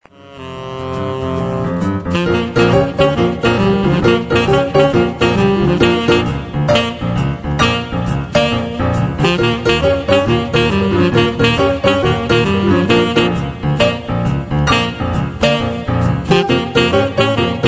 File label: sax